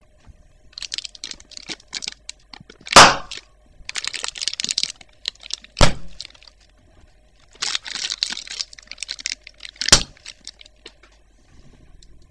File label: plastic bubble burst